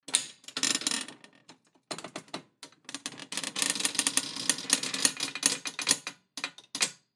Creak Metal Two Person Folding Camp Cot Sitting Movement Stress